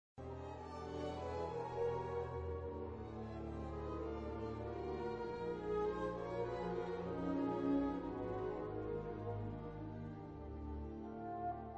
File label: flute concerto; Aho Kalevi